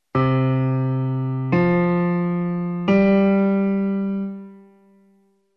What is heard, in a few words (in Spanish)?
graves do m